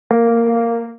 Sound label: tonal tone